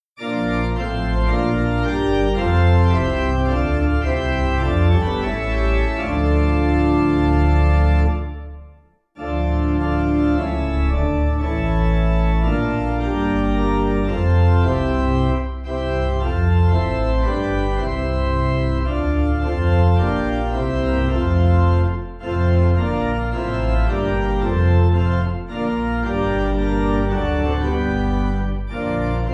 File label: organ, hymn; old 104th; Hymnal 1982